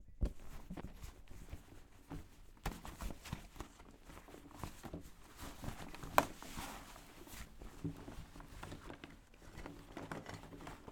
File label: Hand Shuffling In Hand Bag